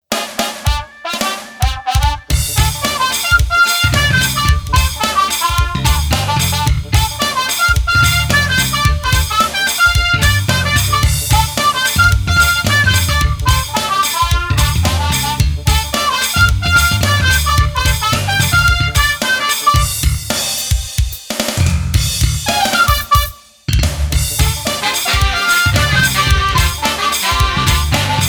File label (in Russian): instrumental